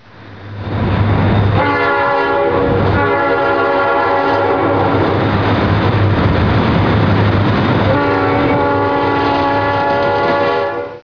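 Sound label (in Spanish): diesel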